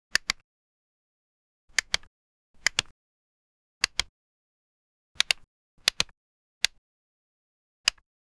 tapping sound of a web, tap

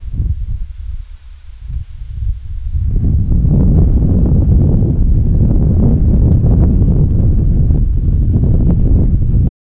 wind and thunderstorm

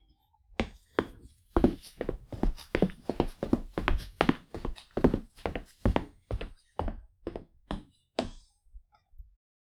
boy slow run from left, mud

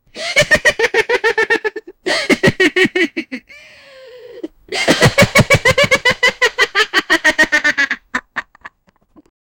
funny laugh, amusement

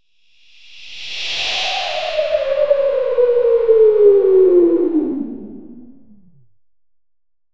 smooth morphing whoosh so

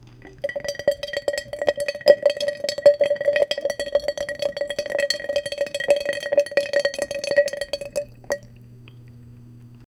mother tiger stirs a pot

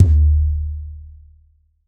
low tom

